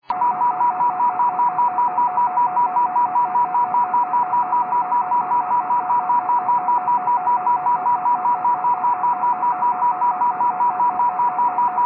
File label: beacon; la5vhf